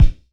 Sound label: Pound, Jomox Kicks; Big Fella; Airwindows; Dimensional Jomox Kicks